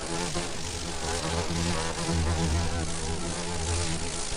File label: Fly